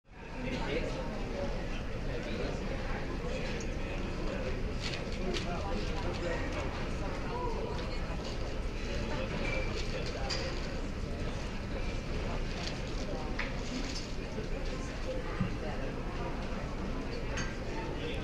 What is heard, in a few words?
people in lounge